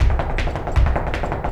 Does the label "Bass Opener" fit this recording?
No